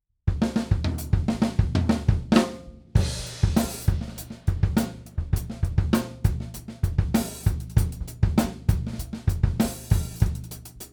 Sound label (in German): unbearbeitetes drumset